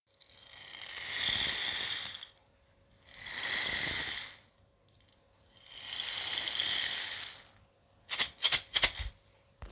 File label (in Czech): hul 25 cm, destna kaktusova hul 25 cm